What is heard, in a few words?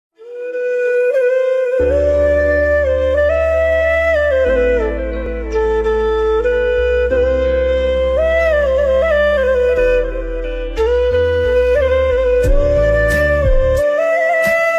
so amazing flute ringtone